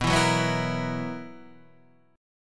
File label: B Major 7th Suspended 4th, B, down